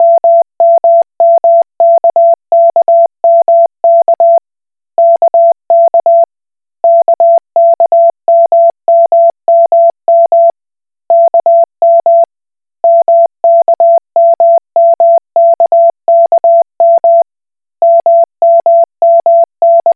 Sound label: Koch Morse